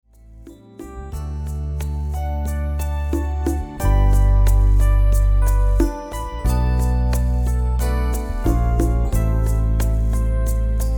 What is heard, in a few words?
Modern, backing tracks